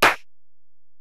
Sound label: EPerc, Alesis DM-PRO